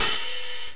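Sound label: open hihat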